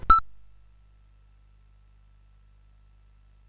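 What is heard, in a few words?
Moon Patrol, MOS 8580 SID chip music, games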